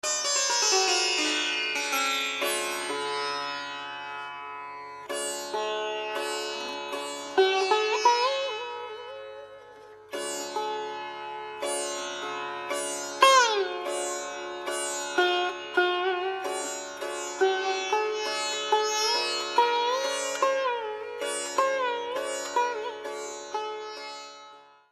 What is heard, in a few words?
instrument sitar